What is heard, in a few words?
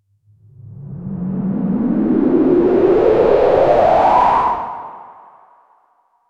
make me a anime power, awakening